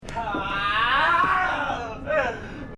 ahhhhhhhhhhwww

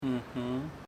mmmhmm

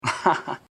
uhhaha

